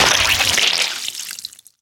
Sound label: chainsaw impact flesh